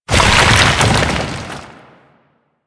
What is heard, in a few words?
Fusion Pillar Fall; Warframe; Warframes; Qorvex